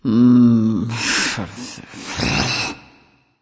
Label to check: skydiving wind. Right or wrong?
Wrong